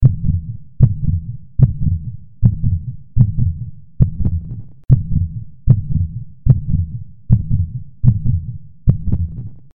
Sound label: heartbeat short